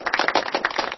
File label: gentle clapping